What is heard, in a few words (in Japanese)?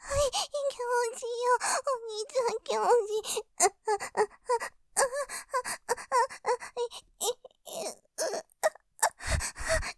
喘ぎ, ASMR; 気持ちよくて頭ふわふわ; ループで楽しむ！シェフの気まぐれ抜き抜きコース; モノラル